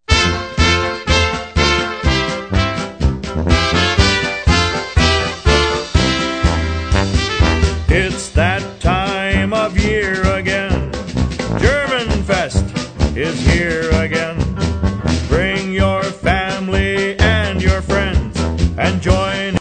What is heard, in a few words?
polka